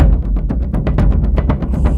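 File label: Music Shield; From Dust; ps3; xbox 360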